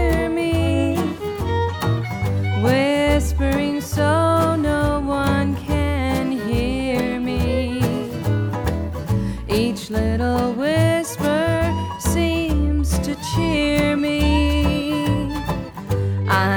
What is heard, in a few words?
Whispering